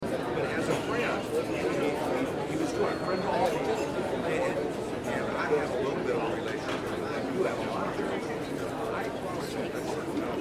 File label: Cafe Coffee House; Ambience; Blastwave